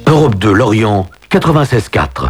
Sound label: jingles; Europe2 Lorient